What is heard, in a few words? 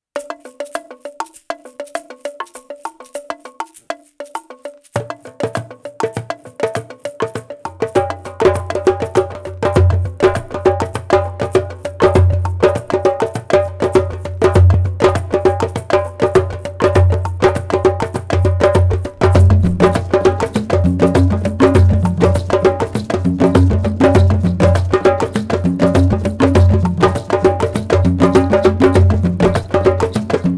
African Rhythm